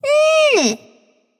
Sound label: tongue light; smite; voicepack; hel